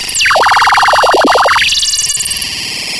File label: Sound of a cat meowing